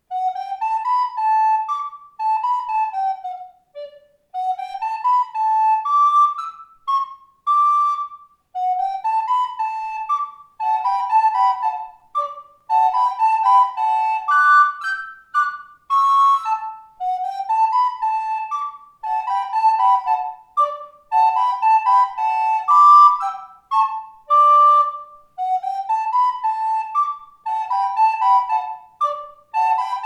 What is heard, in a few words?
Contredanse I, II (duo, tempo 114); J. Ch. Naudot; Flautoškola 2 – METODICKÉ NAHRÁVKY a DOPROVODY